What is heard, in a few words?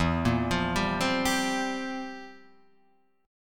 F Major 7th Suspended 2nd Suspended 4th, E, guitar